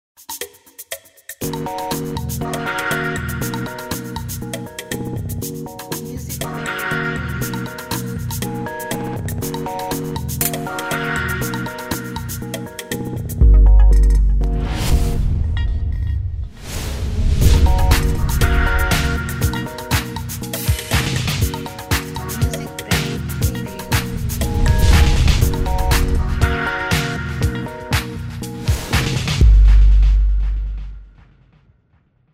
Disco

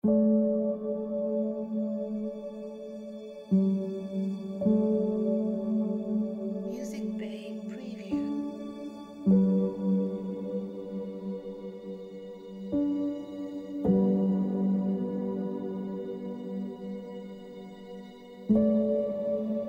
Vivid Luminaries Ambient Soundscape

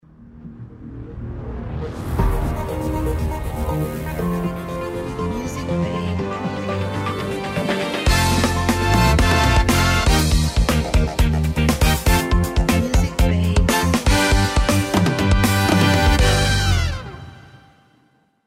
Show Ident

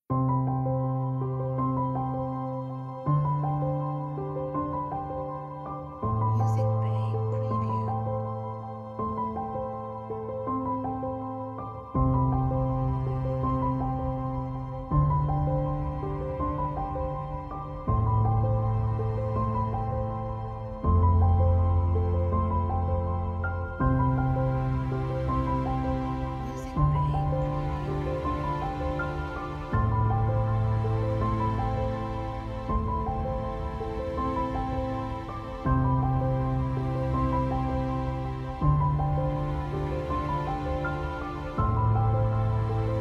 Romantic Inspirational

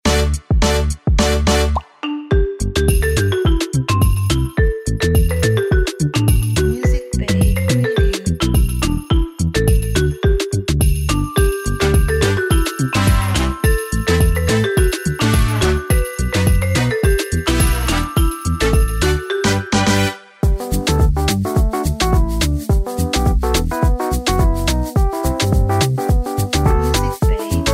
We Wish You A Merry Christmas Club Latin

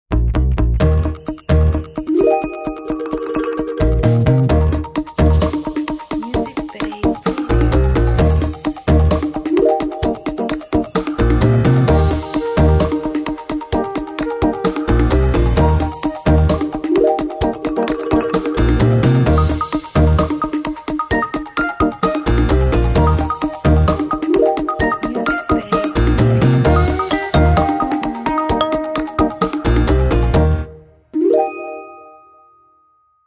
Playful

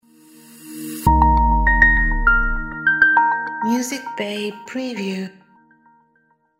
Open Logo

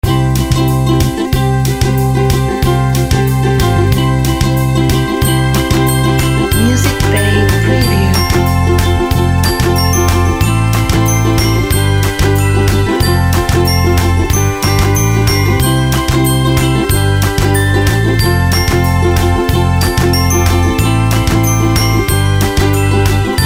Corporate Ukulele Glockenspiel

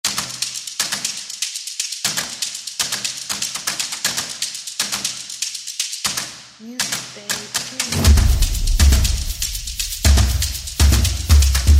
Dynamic Percussion

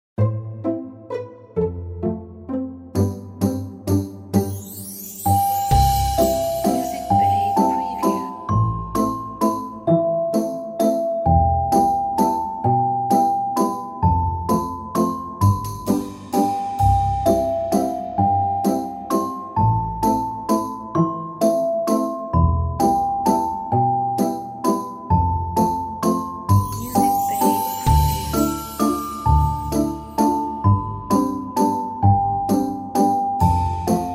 Christmas Waltz For Kids

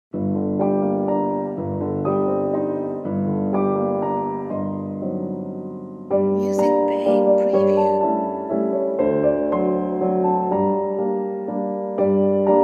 Sentimental Piano Waltz

